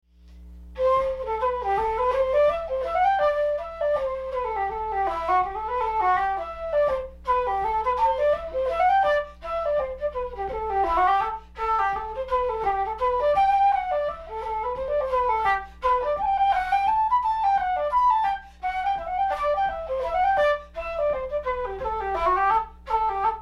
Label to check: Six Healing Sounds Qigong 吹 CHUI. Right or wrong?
Wrong